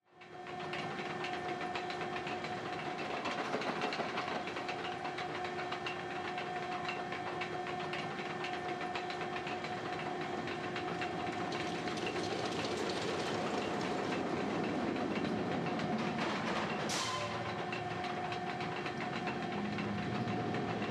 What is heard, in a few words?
amb attraction, helloween